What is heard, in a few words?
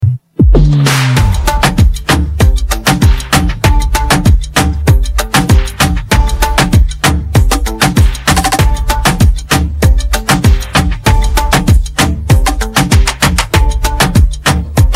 Karaoke Song